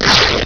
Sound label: TICTIC; Piposh